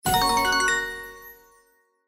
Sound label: Reel Jackpot Wins, slots; Bombo